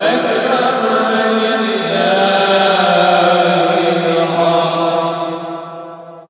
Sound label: chant; The Legend of Zelda Ocarina of Time 3D; The Cutting Room Floor; OOT3D